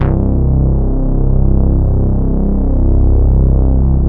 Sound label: MOOG BASS; AKAI S6000 CD-ROM - Ultimate